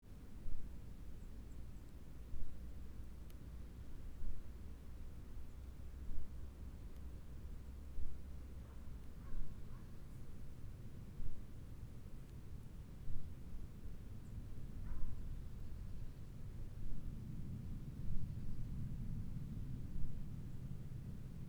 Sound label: atmo quiet distant airplane; atmosphere